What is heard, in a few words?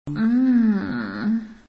Orgasm